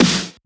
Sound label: Snare; FLPRO; Snares